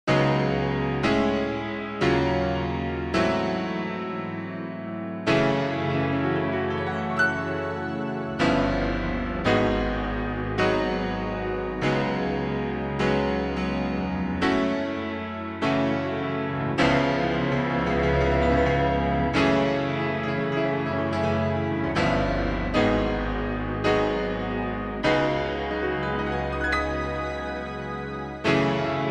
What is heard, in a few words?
Db m, female